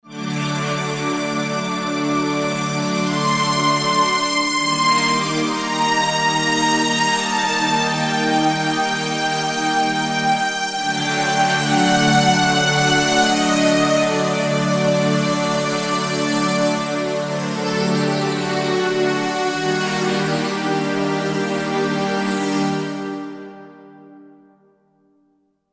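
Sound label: pad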